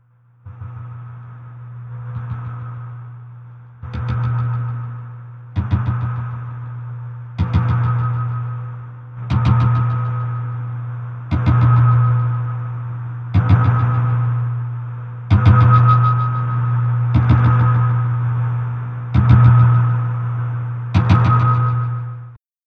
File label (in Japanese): dondon; 迫る恐怖１ （ドーンドーン; 得体の知れない恐怖が近づく; ホラーボイス・声ネタ・効果音・ＢＧＭ